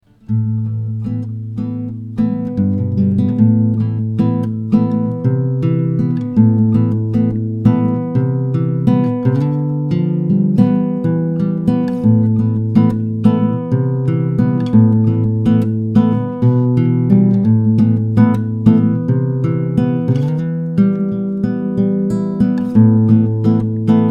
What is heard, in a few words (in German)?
Gitarrenlick einfache Akustik